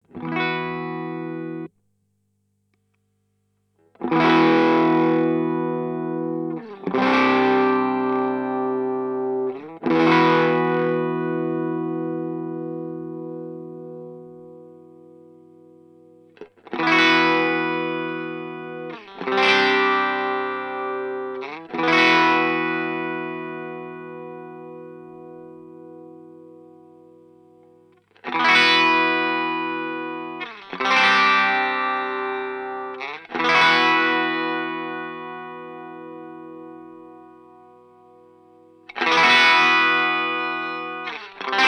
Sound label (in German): Octave Clang Low Gain div Tone Settings; Death by Audio